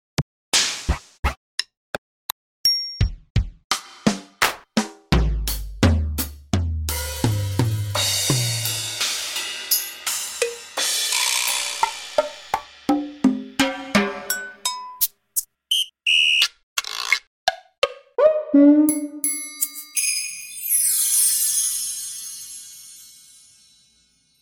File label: Test of 72 drums